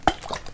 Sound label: bottle, dishes; tuxpaint-stamps; household